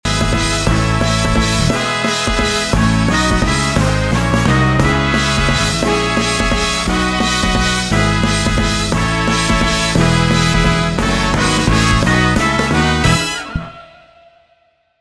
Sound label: Hello Rock, ringtone; MIUI12